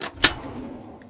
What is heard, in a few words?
disconnect Item SHTCK